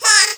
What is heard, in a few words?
bug; bug catcher